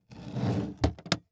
Household Briefcase Drag And Drop Handle

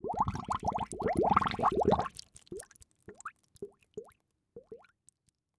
Liquid Water Bubbling Multiple To Single

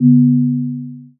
Beep Low Pitch; Multimedia Internet CD-Rom Flash

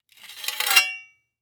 Weapon Broad Sword Pick Up From Stone Floor; AdobeCC

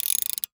Industry Tool Ratchet Turn

